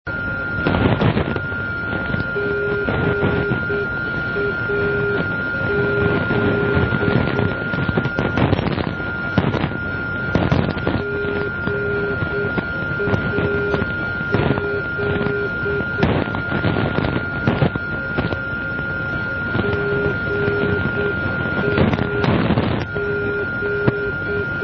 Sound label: GAZ NDB